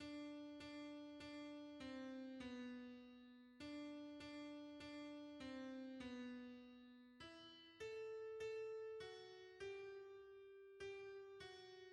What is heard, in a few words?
hymns, alto